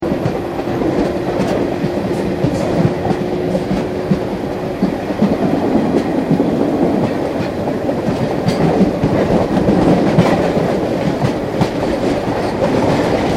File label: Train Melbourne Interior Loop; Public Train in Melbourne; Trains; Vehicles